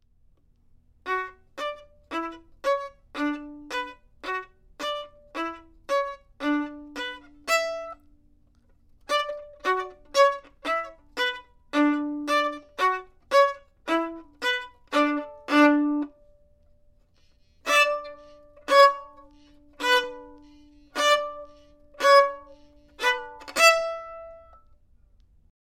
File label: Argument Violin